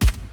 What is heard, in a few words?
Deagle